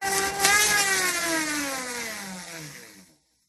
bicycle pushbike wheel tyre spin with paper or other object rubbing; vehicles; slows; zapsplat